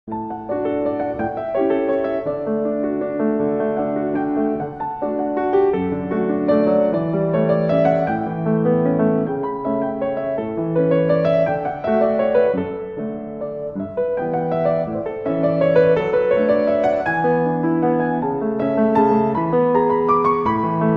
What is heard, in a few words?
Pointe, ballet; adv 1 ballet